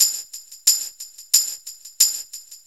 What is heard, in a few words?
Hattentamb, Synchro Funk, Beats; Sonic Foundry (Sony Creative Software)